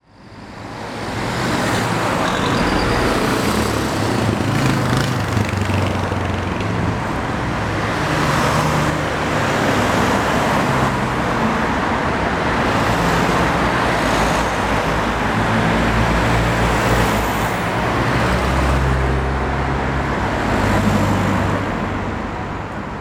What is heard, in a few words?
busy road; Urban Environments; 3maze